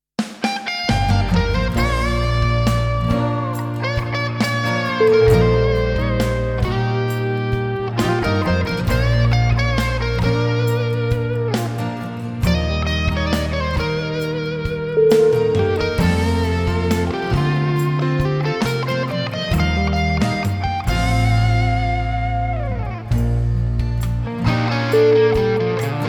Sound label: rocking guitar ballad